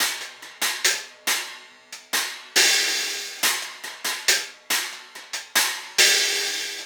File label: Cymbal Pattern